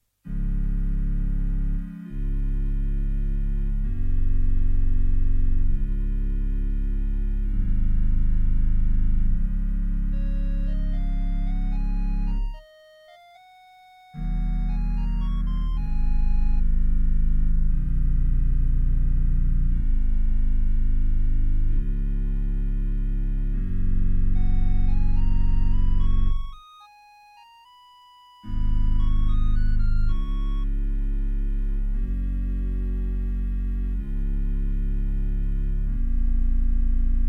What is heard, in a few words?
Dry Chan Mix Chorale Extract; Pastorale; Guilmant